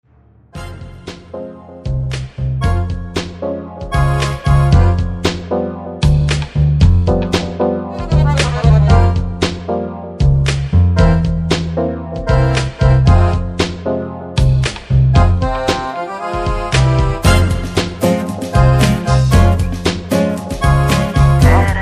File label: Latin Lounge